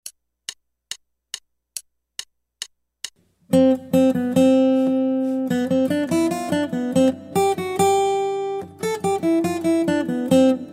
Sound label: Guitar